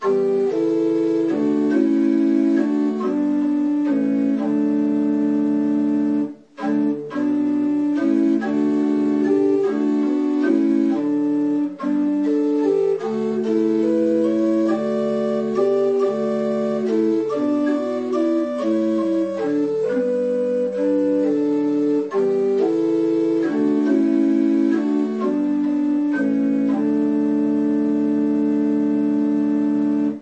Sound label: Hymn; Hymn 193; That Easter Day With Joy Was Bright